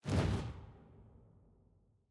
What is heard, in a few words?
ui whoosh next